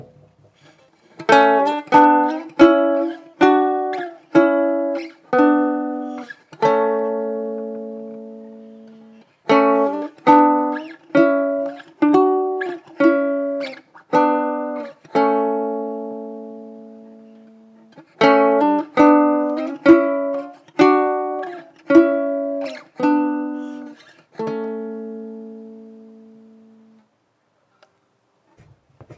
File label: a maj 2-string intervals